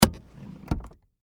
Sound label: Auto Emergency Brake Release Dodge Stratus Automobile Car; Vehicle; Dodge Stratus 2000; Cars